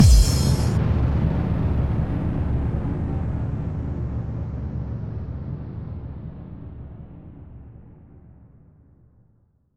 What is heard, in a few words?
Hallkick; FX; Vengeance Samples